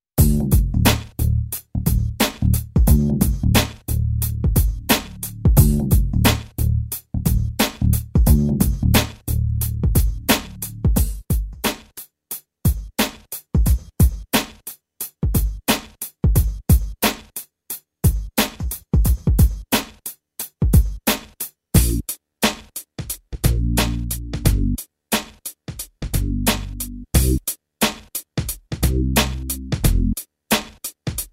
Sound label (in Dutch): Stad - Instrumentaal; Basisschool